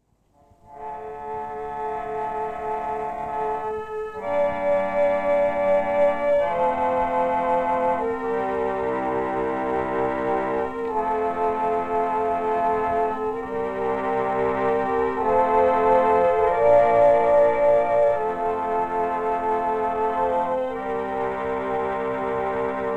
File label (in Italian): solo armonium